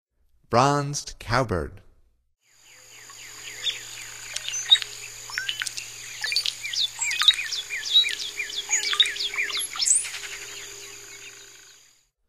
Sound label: Bronzed Cowbird, Songs of Birds of North America; Stokes